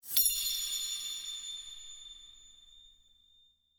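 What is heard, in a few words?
Multiplier Add; OceansGold; netent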